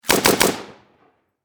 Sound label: Warfare Machine Gun 7.62 Caliber Bren Lmg Triple Shot Distant Perspective